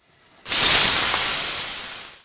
Lava Burn

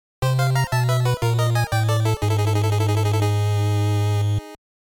Bonus Game Failure; the cowabunga collection

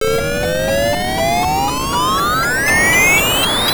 Warping; astro warrior master system

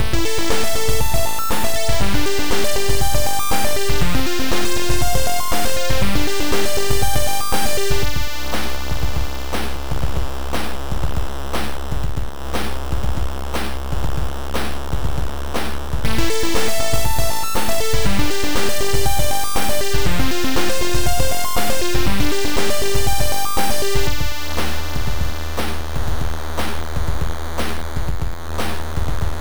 Battle; Formation Soccer Human Cup 90; TurboGrafx-16

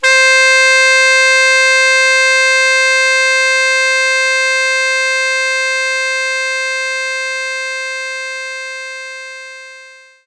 Tenor Sax C4

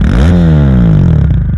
Car Rev

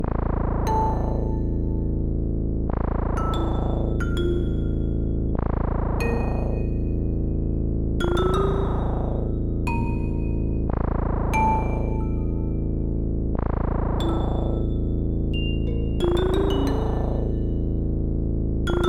Evil Loop

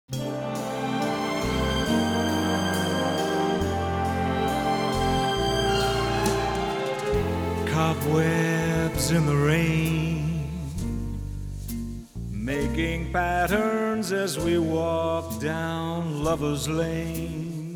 Male Vocal; Life is Strange: True Colors

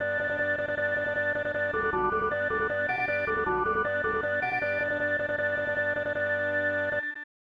Stadium Organ; super bases loaded 2; snes

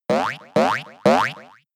Splash; pokemon sfx gen 7 attack moves sumo usum

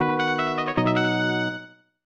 Receive Money; Fire Emblem 4 Genealogy of Holy War; 1996 snes

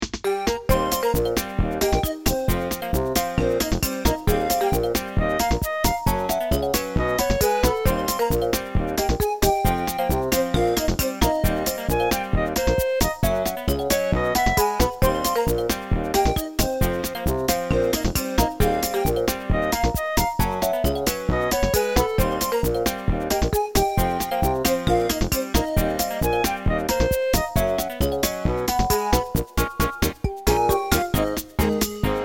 Minigame Win; animal crossing amiibo festival

